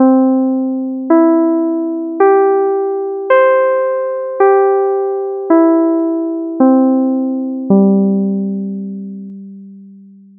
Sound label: piano nocturne